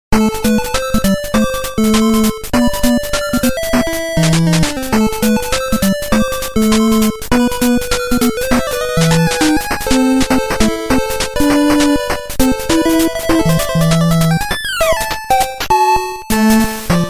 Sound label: dendy funny ringtone